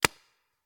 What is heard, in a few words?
Paintball Gun; Sound Ideas; Guns